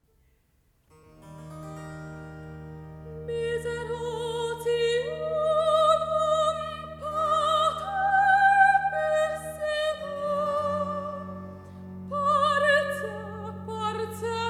recitativo

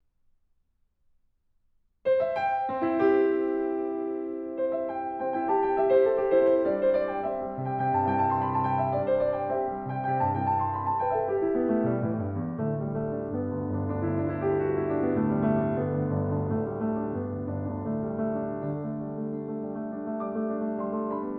per pianoforte; 1930

